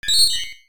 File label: Retro beep